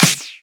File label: Clap; Vengeance Samples